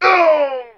Male Death; Mars Sortie

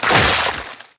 Kamikaze Explosion

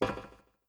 Footstep

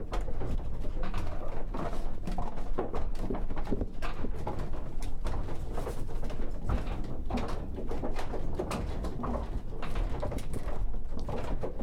Windmill Inside Loop

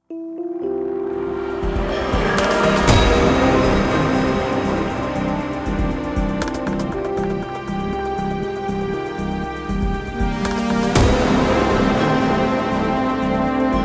Suit Up 32 Bar 119 Bpm

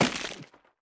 Barrel Death

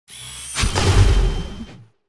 Shock Wave

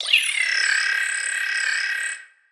Spell Drone Scan; Engineer Spell Drone Scan; Legend of Keepers

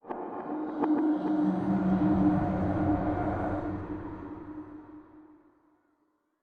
chest riser

